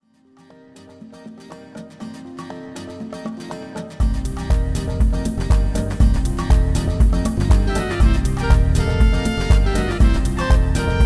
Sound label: tropical hip hop